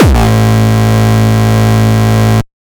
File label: pretty solid kick actually 200 A